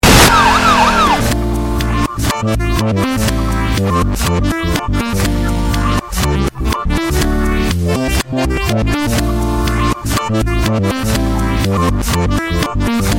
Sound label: funky piano